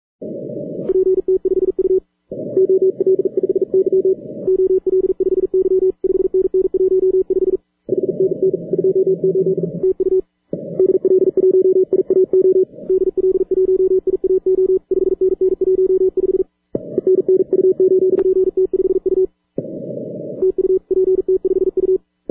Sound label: cw; iaru; pt5u